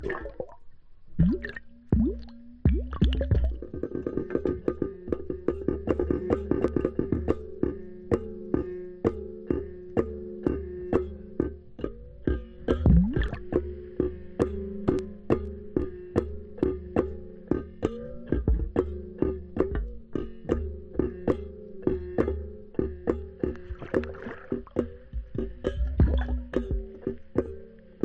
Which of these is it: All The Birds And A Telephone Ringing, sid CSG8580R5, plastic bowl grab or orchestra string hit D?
All The Birds And A Telephone Ringing